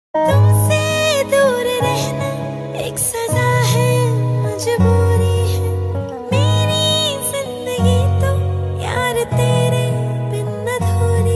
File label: Sad Female Version Song Ringtone